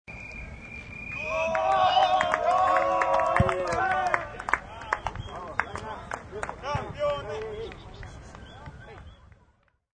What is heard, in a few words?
Spanish Soccer Crowd, Spanish speaking crowd yells and cheers at soccer game; Sports